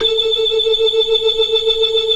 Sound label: organ; Keith Emerson Signature Series